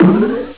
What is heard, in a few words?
doiinggg